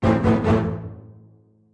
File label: orchestra hit melody kit; PADS and STRINGS